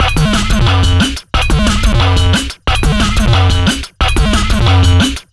090bpm, Downbeat Dance Grooves

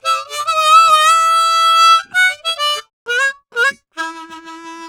Harm 098bpm A, Harmonica

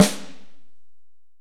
ST AMB SN, STEREO AMB SNARE

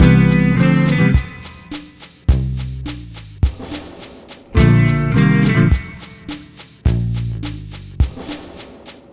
Multi Guitar Bossa Mix-C, 105; Zero-G Kocktail Kollection; Construction Kitz (Lounge)

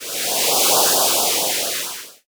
noise fx; Masterbits; Switch FX

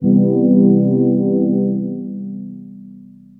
vio analog, dx7

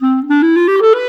Clarinet, Cinematic; Sonic Foundry (Sony Creative Software); Orchestral Series VOL-3

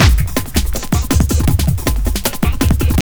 JUNGL 160, JUNGLE LOOP

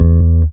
E bass (E); Sonic Foundry (Sony Creative Software); Ugly Remnants VOL-2; Basstuff

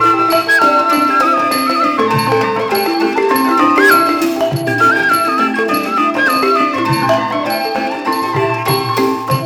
100 THAI, THAI ORCH PH; Spectrasonics - Heart of Asia CD2

